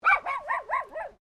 Animal Dog Poodle Miniature Bark; Various domestic dogs; Animals

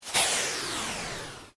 Created sweep pulse quick; Sweepers; Sound Design; Various sweeps, pulses and drones

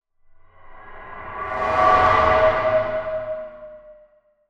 Breathy Metal Transition; Scary Soundscapes; Horror

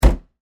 Ice Chest Lid Close, Restaurant; Miscellaneous Bar Sound Effects; BlastWave

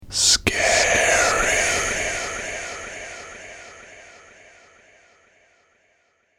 Scary Voice Echoes, ghost sound effect; horror sound effect; Andrew Fleming

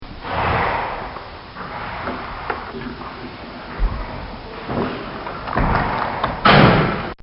Ambiance Found Inside A Stable

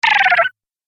Arcade Game Fast Beeps; Video Game Sound Effects; Retro Inspired; Nintendo, Atari, C64

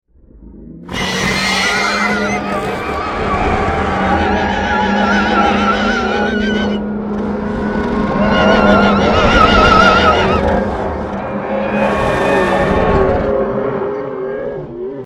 Demon, Horses, Creatures, Horror; 5.1

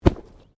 Body movement Fall Medium

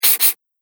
Pencil On Paper; Writing Sound Effects; Foley Sound Effect; Andrew Fleming

